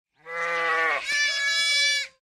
Boo; Farmer; Pitch Black Audio Pong

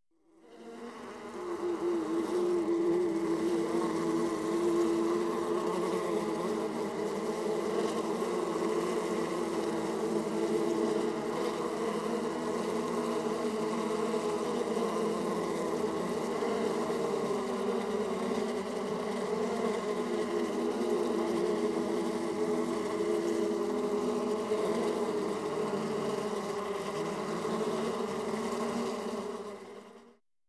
Bees; Scary Sounds; 1001 Sound Effects; Halloween Horror Sounds Effects

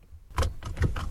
seatbelt unfasten; Apotheorasis

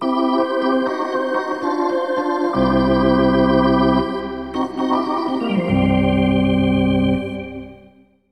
Hockey Organ

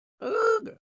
horn cartoon; NCH Library

